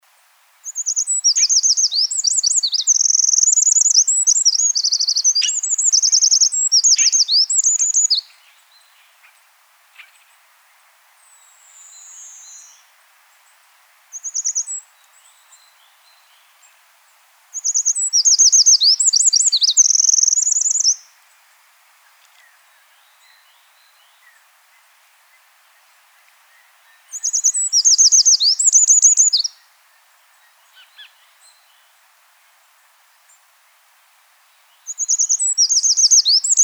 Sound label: Wren